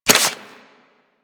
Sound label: Whip Equip; Warframe; Weapons; Corpus